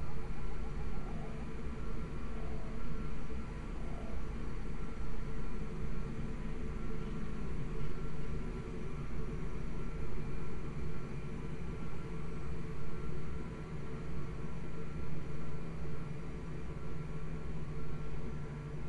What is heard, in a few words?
amb ship room intro; Titanfall 2